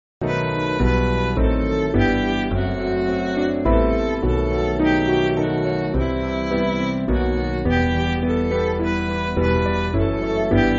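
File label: Midi, Backing Files for Churches; O Thou Who Hast; Buddugoliaeth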